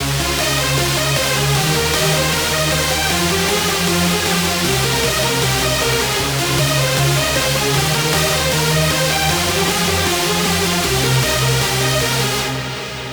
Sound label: lead loop Fmin 155; Music Loops; GhostHack